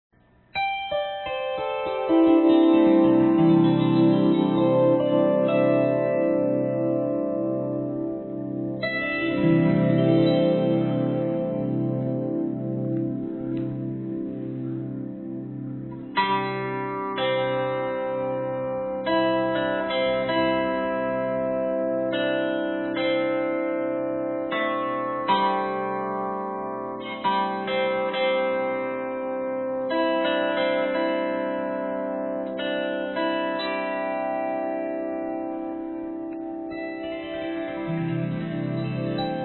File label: Sensory Harp